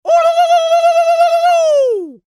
Turkey call maybe

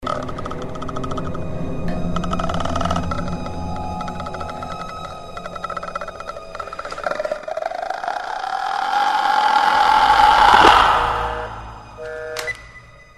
Croak; The Grudge

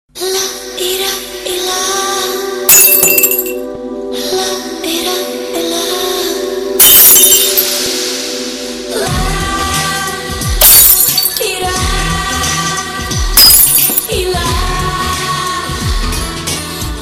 Glass Break; Liril

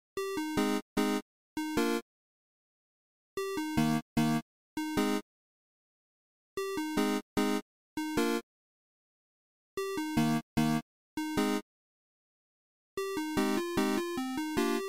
8 bit

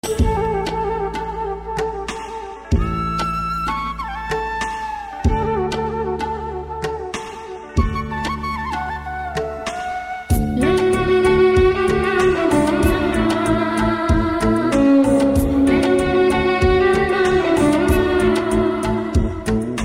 Classical Cool

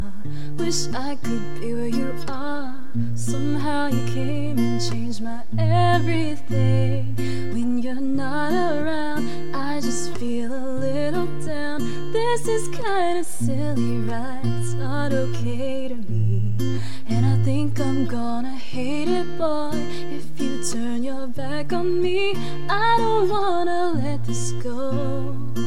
Female

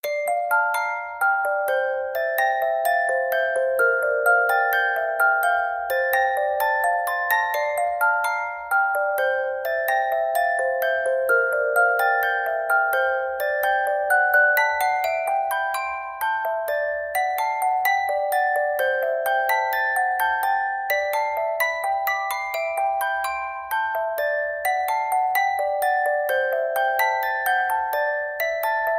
Lullaby

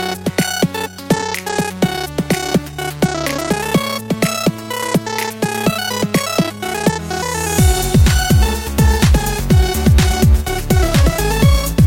Wake Awake Ringtone

